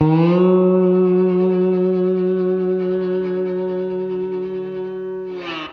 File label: Guitar & Bass; Quantum Leap